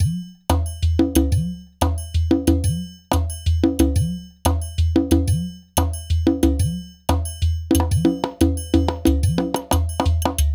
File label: Percussion, cinematic funk